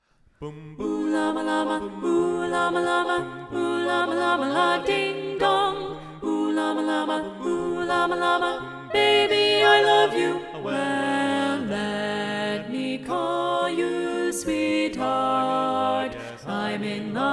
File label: ALTO SATB Alto Predominant